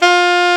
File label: SAX A.MF, Alto Short